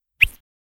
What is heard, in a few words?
soft squish quick pop; vanish